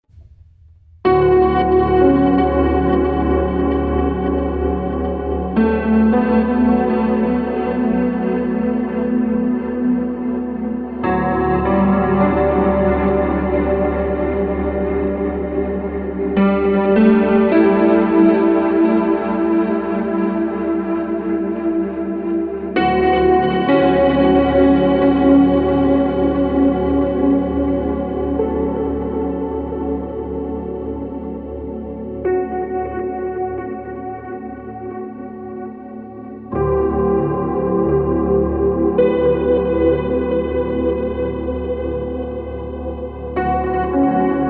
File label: Rare Piano